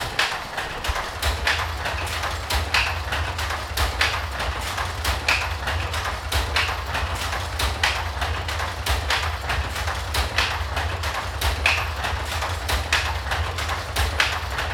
looms, South India street recordings

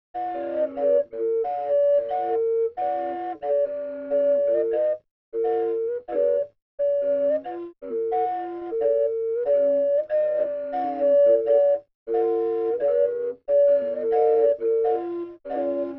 flute gannets; 2006 Film Score Inc

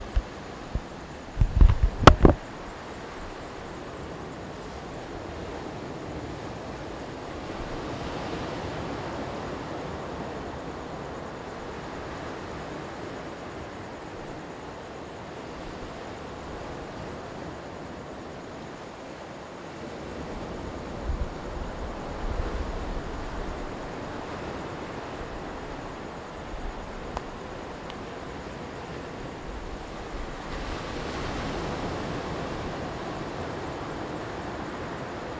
sea waves, South India; South India street recordings

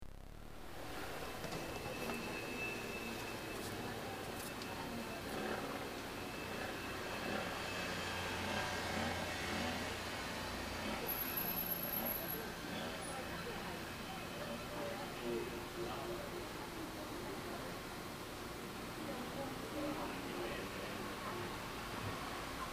quiet ambience

